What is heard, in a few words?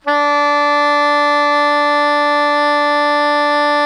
SOPRANO D 3, Saxophones; Greytsounds; Philip Wolfe David Crozier's Saxophones